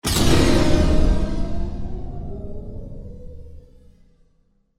Horror

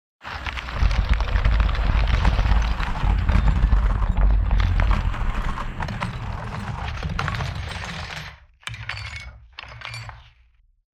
collapse

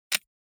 Ringing Katana Tsuba

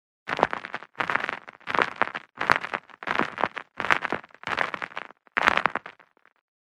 gravel road

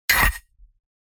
UI Y axis

